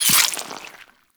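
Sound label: Infested Splitter Gore Spew Projectile By; Warframe; Grey Strain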